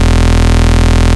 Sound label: Bass Shot E